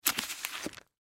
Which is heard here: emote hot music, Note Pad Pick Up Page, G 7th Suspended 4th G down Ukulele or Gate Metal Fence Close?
Note Pad Pick Up Page